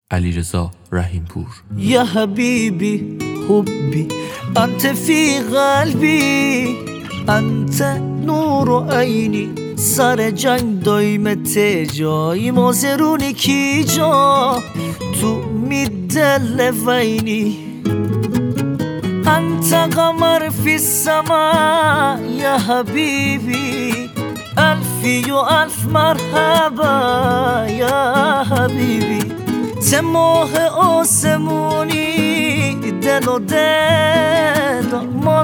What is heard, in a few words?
mazandarani ba gitar remix